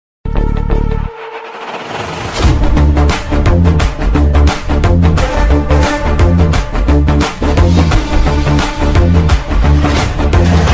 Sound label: malayalam bgm; kaduva; police station